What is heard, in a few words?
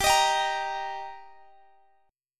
G Minor Sharp 5th G